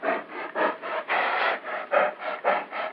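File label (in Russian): тяжёлое сиплое неровное дыхание медведя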